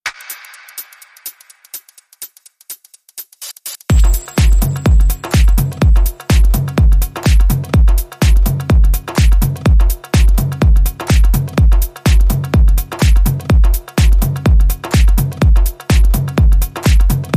Beat

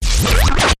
Course Ripper Zap

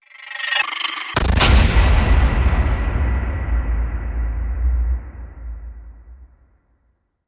Hit Diffused Fuse

